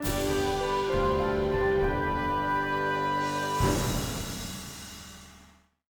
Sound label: Piano; Haunted Mansion; xbox